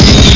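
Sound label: minigun; rox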